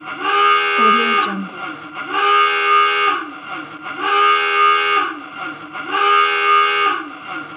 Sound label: alarm siren wailing